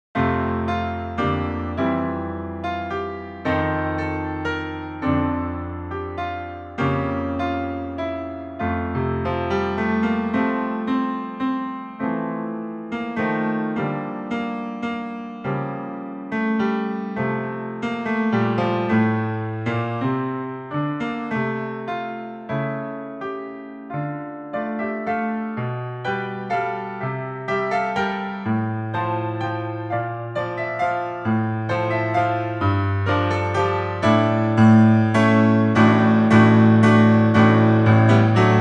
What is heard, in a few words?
Piano Solo